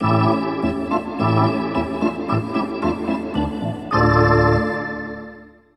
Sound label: Hockey Organ